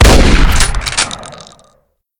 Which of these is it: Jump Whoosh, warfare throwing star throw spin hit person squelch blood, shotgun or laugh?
shotgun